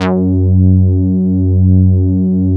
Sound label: MOOG TAURUS PHAT F#2; Big Fish Audio - First Call Vintage Keyboards